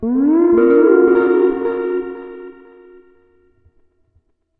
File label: Echo Melody; Hylics